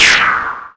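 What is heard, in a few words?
laser hit ground; darwinia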